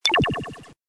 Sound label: button start